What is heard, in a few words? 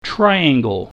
triangle